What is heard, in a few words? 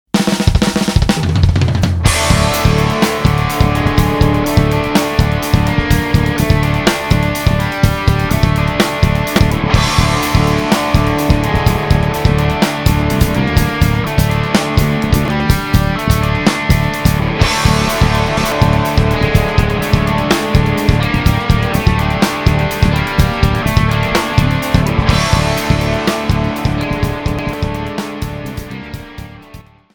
pulse